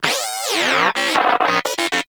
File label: Inquisitive Vocal Stomp; Pets; Warframe; Moa